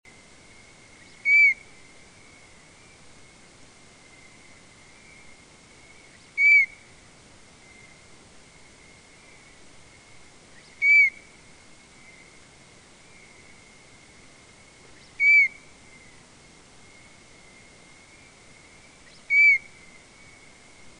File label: peeping cricket half speed